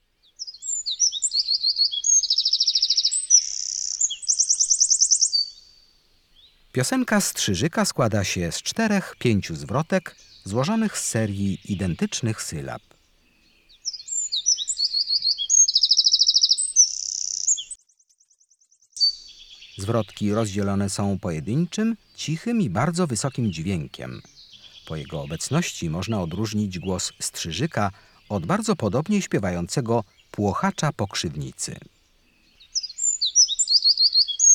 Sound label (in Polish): Strzyzyk, Ptaki; Kurs PTTK